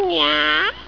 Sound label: MEOW; George And Martha